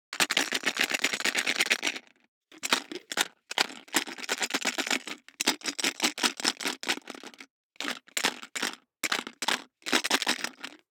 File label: DICE in Plastic Cup Closed, Shake, 6 Six Dice; Samples; ArticulatedSounds; DICE Sfx Party (Extended)